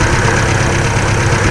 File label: dacia, vehicles